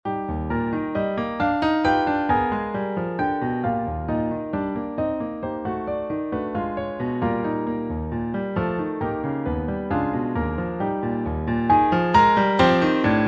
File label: Piano